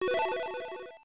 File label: Press Start; Street Fighter Alpha 3; Zero 3; CP System II; arcade